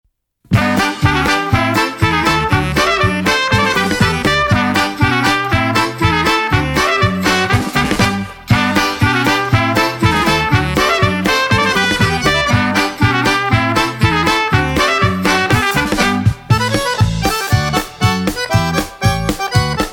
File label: Cat Polka